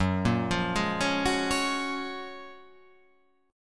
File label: F sharp Major 9th